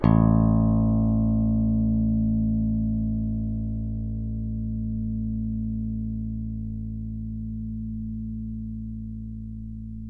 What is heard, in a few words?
A0 pick, bass